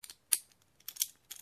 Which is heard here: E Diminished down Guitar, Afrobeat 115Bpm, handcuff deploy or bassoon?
handcuff deploy